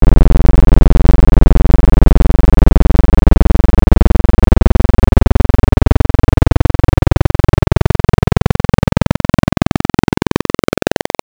Square PWM, Wavetables; Cymatics; Future House Wavetables; Serum

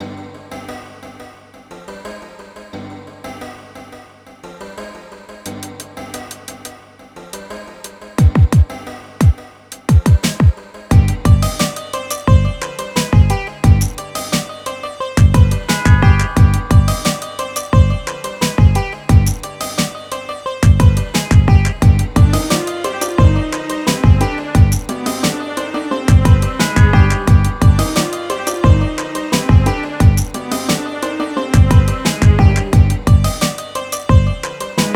088 Gmin, Hip Hop; Hip Hop Exotica; Apple Loops; Big Fish Audio

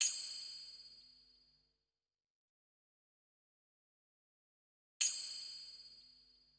Percussion; Hip Hop; Apple Loops; Big Fish Audio

